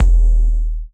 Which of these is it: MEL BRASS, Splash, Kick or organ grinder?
Kick